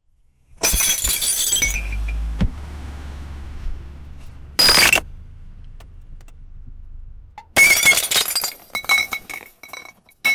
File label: a car is driving a; everyday life